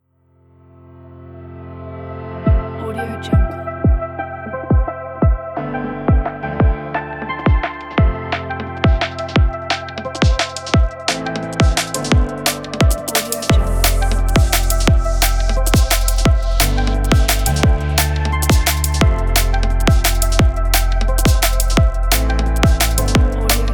drum and bass

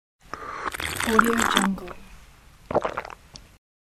drink from a cup

